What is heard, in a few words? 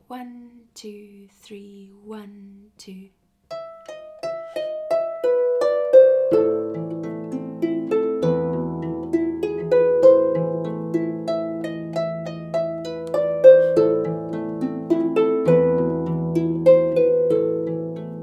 Fur Elise Full speed Play through, Big Harps